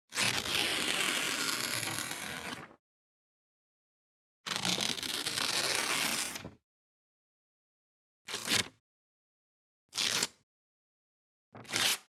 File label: Paper Crumpling Tearing Tossing; افکت صوتی